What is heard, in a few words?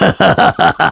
Taunt Spin Laugh; Ganondorf laughing after spinning around (up taunt)